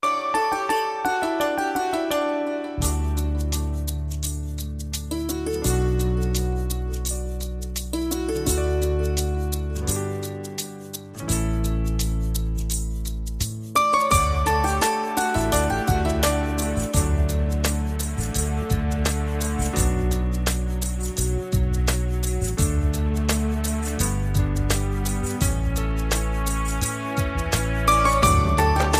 nice classic

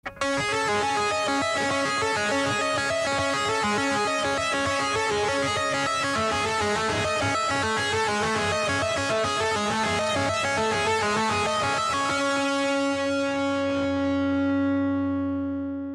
Tapping

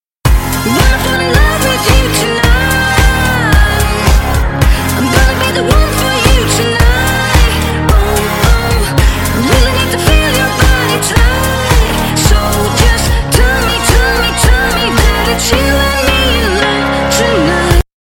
RNB

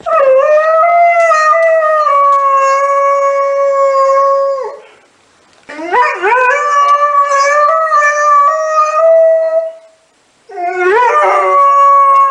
Dobermann Howl

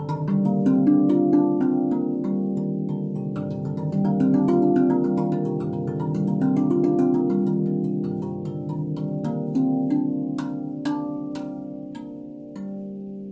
Handpan

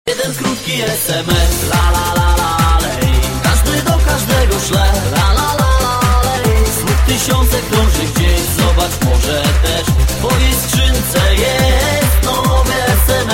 sms song

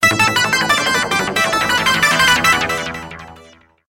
quick ringtone

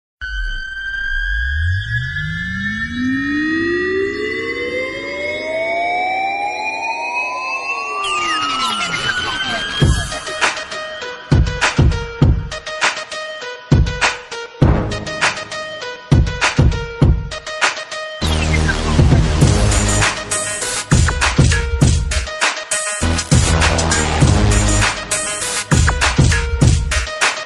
trance hip hop

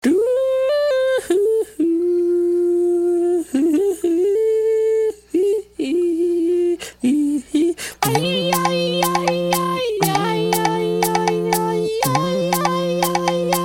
Tribal Chant

